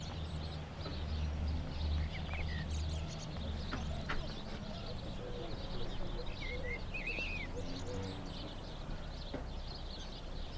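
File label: Scottish exterior evening; the 39 steps; SCOT MARSHALL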